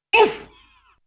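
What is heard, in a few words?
Kart Damage; Gamigo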